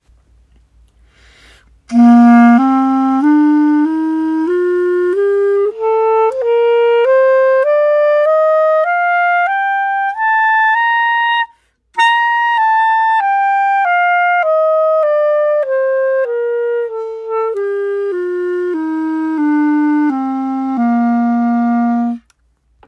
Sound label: Clarinet chromatic scale